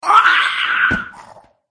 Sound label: death